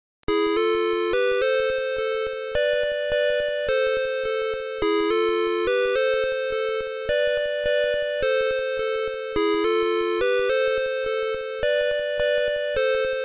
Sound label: gameover